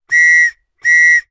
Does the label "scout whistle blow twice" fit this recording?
Yes